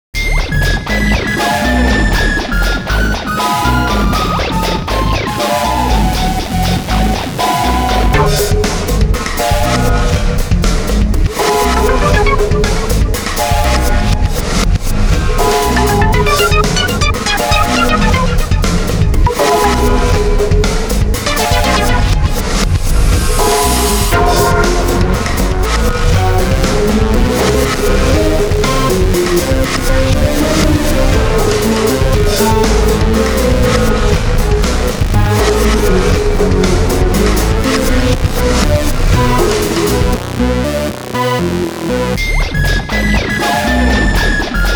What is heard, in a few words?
Tension; Tenkuu no Symphonia